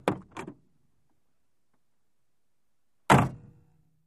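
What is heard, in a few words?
Buick, Exterior Pov, Door Open and Close, close up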